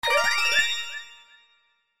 Win Wild; slots; Robot Vs Monster

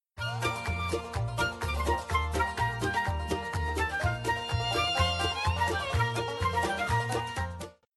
BGM Bonus; Thanksgiving Blast

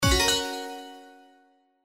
High Symbol Wins, SLOTS; Majestic India

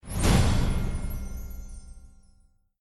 Bonus Intro; slots; Realm Saga

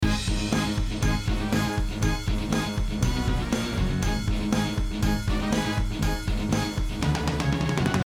BGM Free Spin; Realm Saga

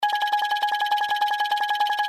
Max Total Winning Count Up; slots; Valentine Blast

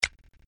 Button Prev; St Paddys Day Blast

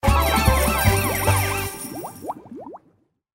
Big Win; FinWin

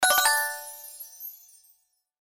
win scatter; slots; House Of Luck

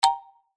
Bonus Out; slots; House Of Luck

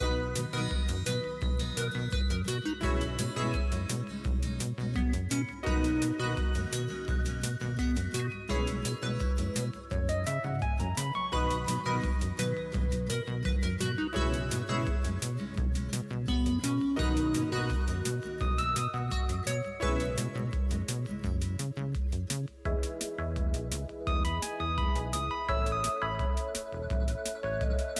free spin; slots; Gem Fortunes

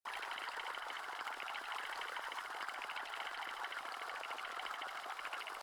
REEL SPIN, SLOTS; Jurassic Jungle

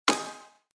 Stop Btn; slots; Wealth Gods2

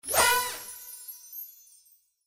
BGM BONUS SUMMARY; slots; Jamaican Jackpot

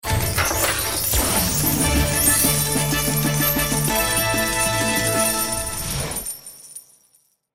SFX Big Win; slots; Steam Miners

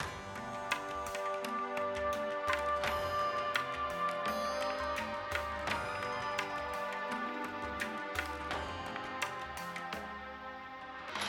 BGM Paytable; Genies Wild

